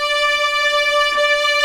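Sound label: viola D5, ARCO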